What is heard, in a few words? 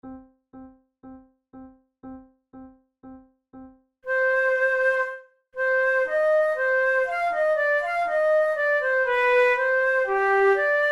prelude, flute